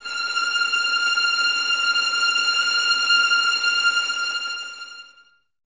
Violins Tremolo F 6; Miroslav Vitous; 11 Violins

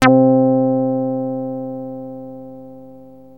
Access Virus B; Synthworx

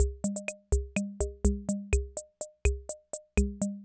Loop, classic machines; arranger

